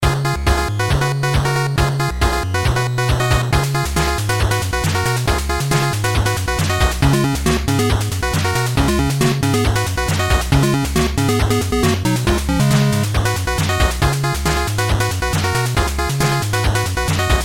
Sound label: 8bit